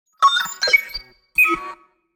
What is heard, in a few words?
Futuristic data calculations short